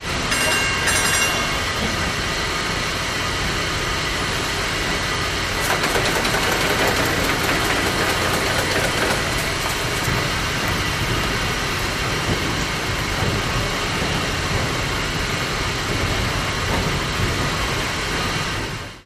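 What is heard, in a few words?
steam engine telegraph, WWII Liberty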